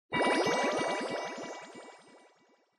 Scene Fantasize Short

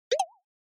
Percussive Accent Low; 打楽器アクセント09-2(低)

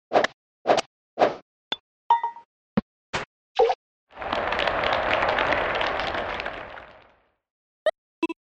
SNES Golf

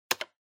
PC-Keyboard (Space-Hard)

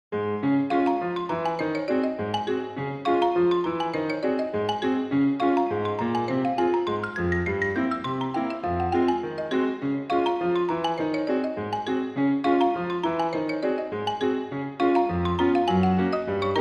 Fast; bgm

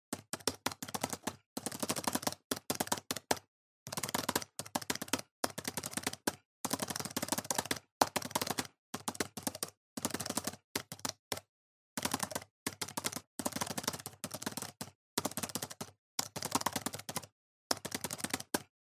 PC-Keyboard (Hard)

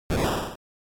General Noise Phrase

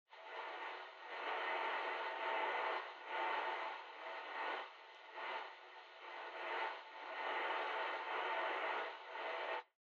Radio Signal Noise Far AM

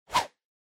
Motion Swish; モーション・スイング

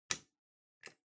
Light Switch Wide Panel Dry Gentle

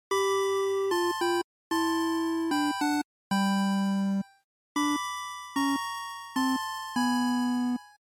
NES Shooter

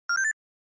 Short Accent Dry; ショートアクセント; ドライ

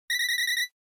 NES Racing Tire Squeal

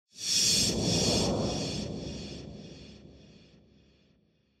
Scene Flashback Long

